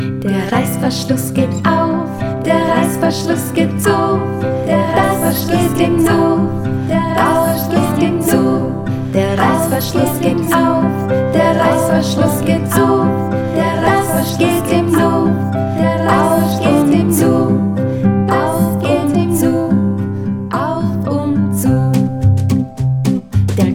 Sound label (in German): Der Reissverschluss Kanon